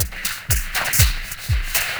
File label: 120 Dance E-Perc; Dance DJ; Percussion